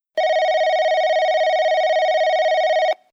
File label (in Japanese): 発車ベル; 福工大前